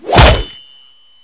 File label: dagger hit